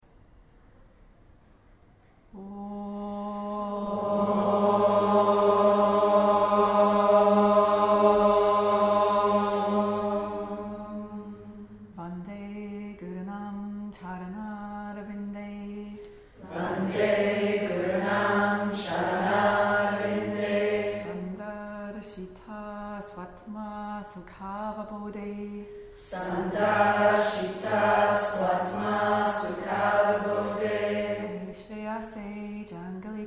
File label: ashtanga opening chant